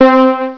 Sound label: Piano Elec; HyperComposer DEMO